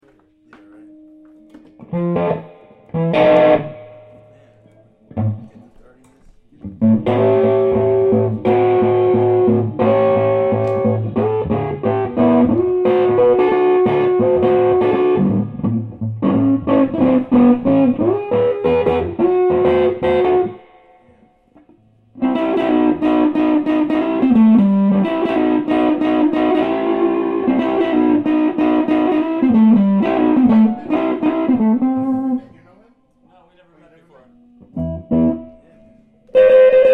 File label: dirty bassy blues